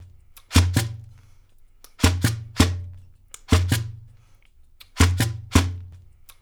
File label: loop, funk percussion; InVision Interactive; Percussion Slam